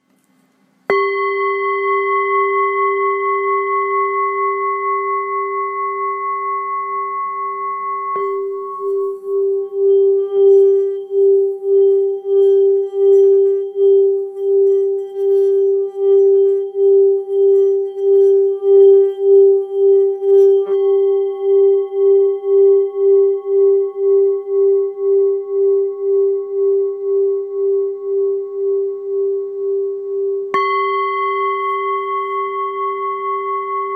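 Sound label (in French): Bol bengale do dièse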